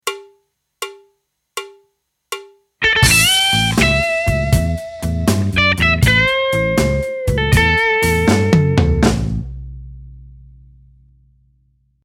blues

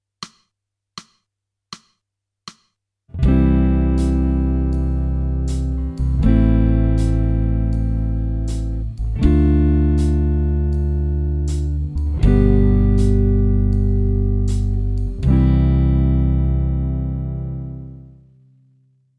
jazz

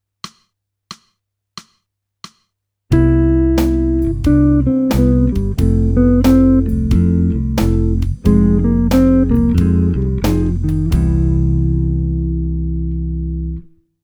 jazz

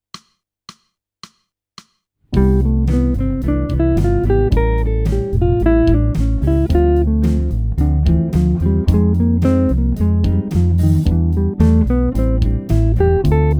jazz

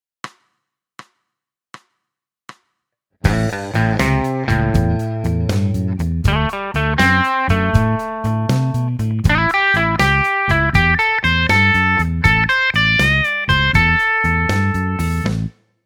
blues

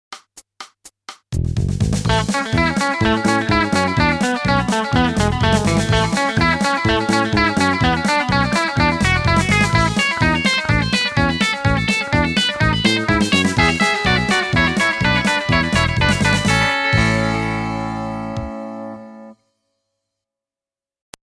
country

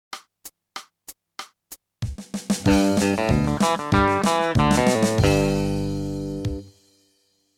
country

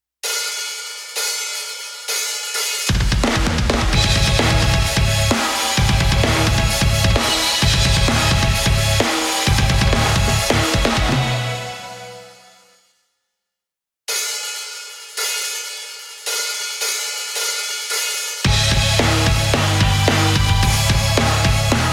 karaoke

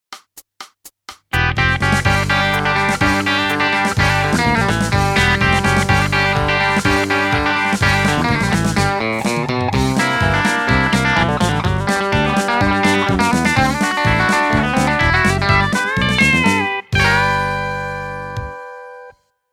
country

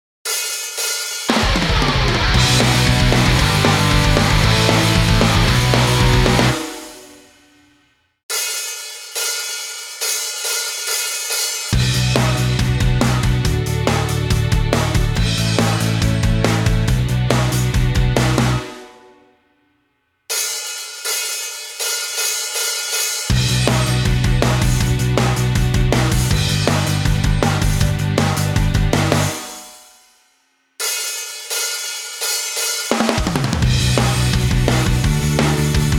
karaoke

